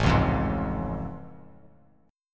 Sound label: A Augmented Major 7th A Bass Standard; strummed; JGuitar